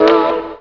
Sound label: CHORD; AMG - Vince Clarke Lucky Bastard